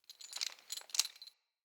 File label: Tent Ring Jingle, Interior; Soul Plane